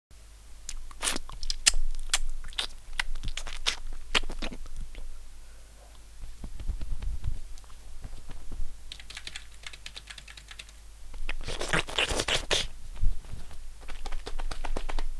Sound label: fluter